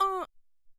Ugh

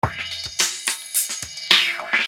Filter Groove; Construction Kit; HIP-HOP; Sonic Foundry (Sony Creative Software)

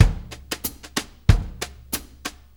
Country, Drum Loops

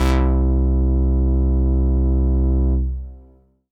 Synth Prophet Bass C; Electronisounds

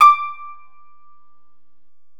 KANUN D5

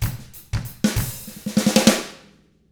95 FUNK END L, drums; ILIO; Double Platinum Drums 1

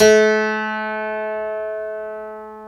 PNO, Tack Piano; Club-50 - Foundations Roland

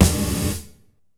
Gated Snare; Univerb; creative gate; Gated Reverb

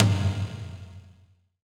TOM, percussion reverb; MARKYDRUMS

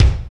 SPD-8, DIGITAL; 90 s Sample CDs; Sound & Vision - Gigapack I CD 1 (Roland)

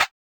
Drums; Distored Reality

